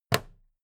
plastic clasp close regular

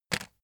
chocolates take short

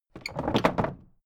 old wood case wobble

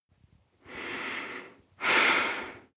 breath female breath heavy

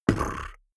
beatbox bass drum tongue roll damped

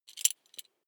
metal belt buckle clatter regular

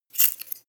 scissors cut paper

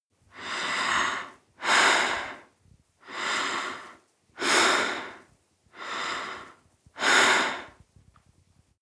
breath female breath deep sequence

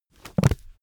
heavy book shut

